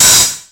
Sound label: 909 OHH; Wizoo; Platinum 24 Electronic; HHAT